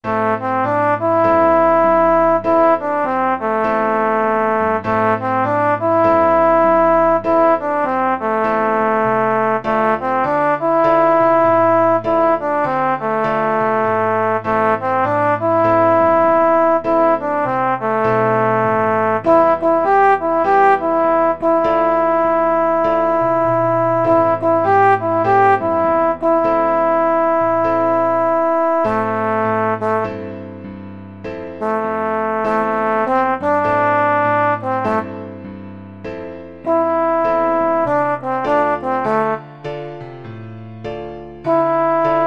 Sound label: blues, trombone